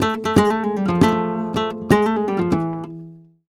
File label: Flamenco Guitar Remates De Pulgar, e